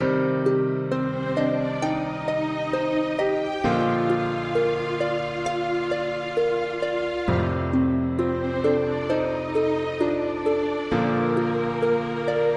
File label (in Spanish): Trompeta Cristiana